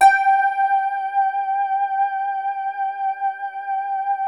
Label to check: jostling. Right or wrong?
Wrong